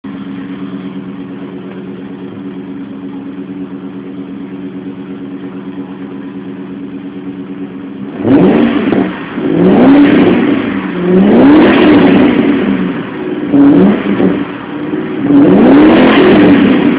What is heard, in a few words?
magnaflow, Car; Magnaflow Exhaust